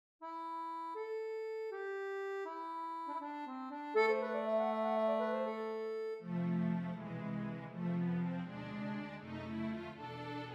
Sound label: rondo c section